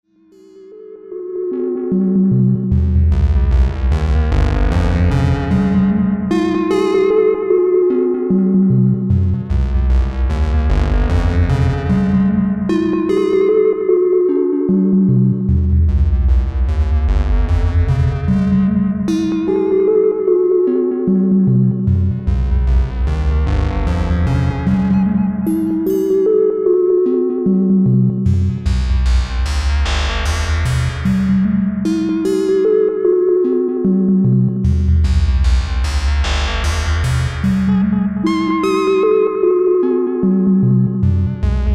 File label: blend, Dual VDO